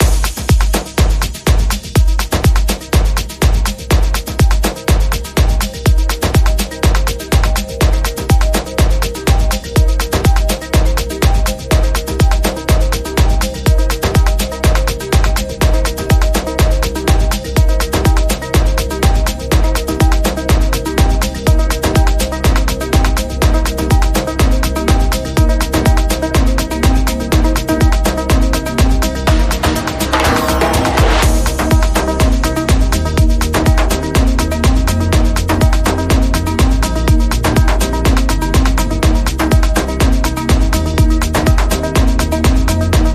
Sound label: Seductive Synth